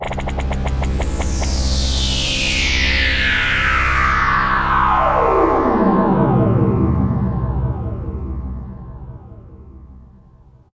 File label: SWEEP NOISE; SYNTH; AMG; Global Trance Mission VOL-2